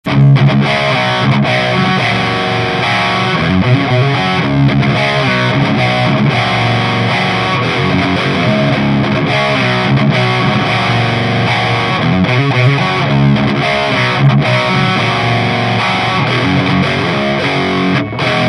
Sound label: Modded TSL Lead Channel; Dan Hopler